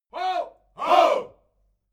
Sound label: military shout ho